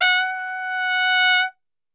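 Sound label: F#3 trumpet; Best Service; Brass Super Section; TRP SWL; 90 s Sample CDs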